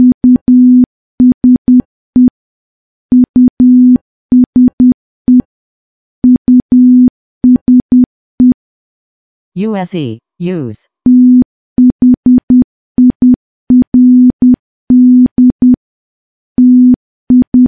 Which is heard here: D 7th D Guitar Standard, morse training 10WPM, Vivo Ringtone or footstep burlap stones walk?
morse training 10WPM